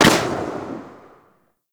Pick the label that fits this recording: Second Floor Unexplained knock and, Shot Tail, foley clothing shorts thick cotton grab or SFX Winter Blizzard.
Shot Tail